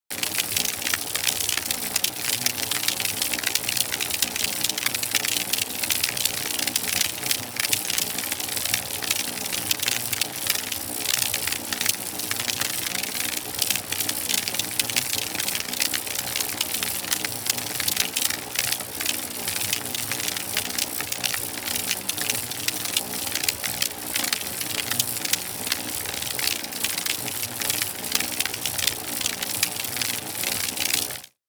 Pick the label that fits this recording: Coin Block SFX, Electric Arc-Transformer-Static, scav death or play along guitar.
Electric Arc-Transformer-Static